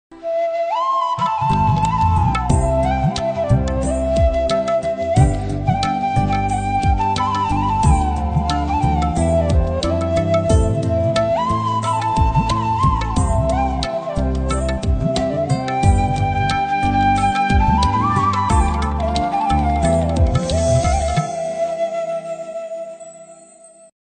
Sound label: jesus ringtones phone ringtone heart touching ringtone emotional bgm love bgm christian